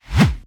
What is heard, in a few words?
Heldbeat Mid Sing Sing